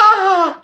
"ah"

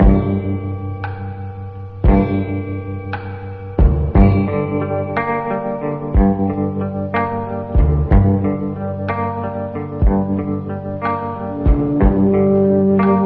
flute, Loops

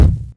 Kicks Loud

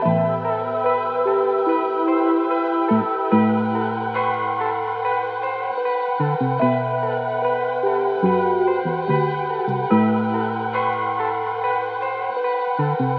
keys

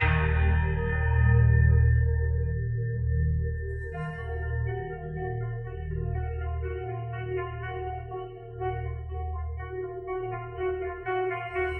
piano, sad

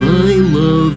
"i love", SUNG; barney

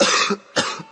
coughing; ooh ahh; human

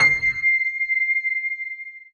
piercing, Piano; Notes

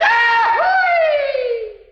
scream goofy; ooh ahh

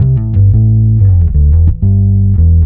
bass, Loops, Phonky

